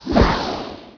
poof

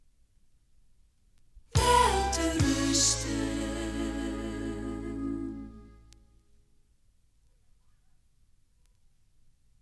topformat maxi jingle single; topformat maxi jingle single4

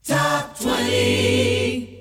jinglepackage; topformat LP jinglepackage 2

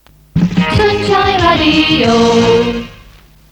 sun Shine Jingle; SunshineJingles

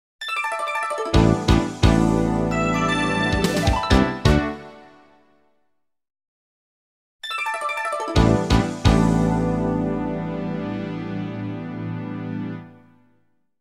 Station Call

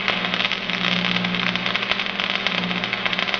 welding torch loop

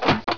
DOOR

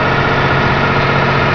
ENGINE; motoren

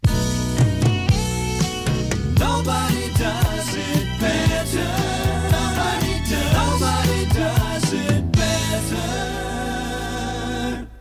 topformat maxi jingle single

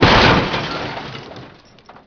crash gate

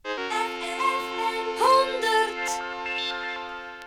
jinglepackage; topformat LP jinglepackage 1

